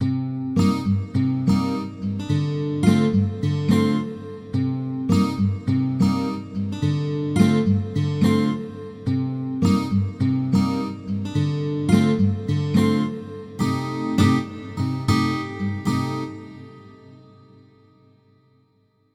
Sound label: Twelve String with Pad